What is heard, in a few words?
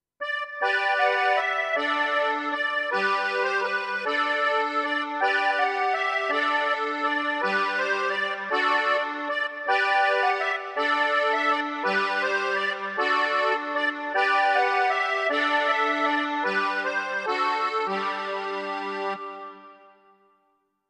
Accord It